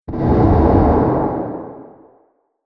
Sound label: Horror Explosive Impact